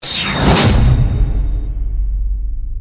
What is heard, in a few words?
dragon tiger vs